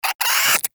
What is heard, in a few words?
Cryptilex Vocal Attack Short, Hunting Critters; Characters; Warframe